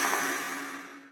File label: turtle hissing; minecraft; technic